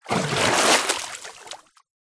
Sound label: nature water movement splash from underwater to surface